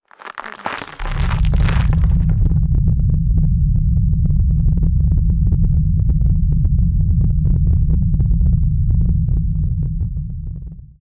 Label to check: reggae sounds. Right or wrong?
Wrong